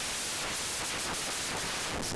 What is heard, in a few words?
noise